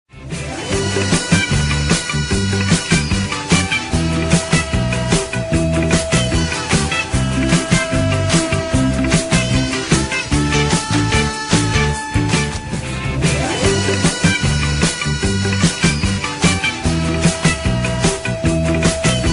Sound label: ringtone